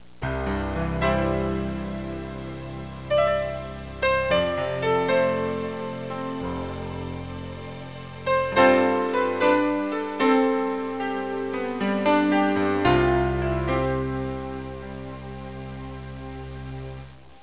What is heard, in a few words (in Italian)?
musica newage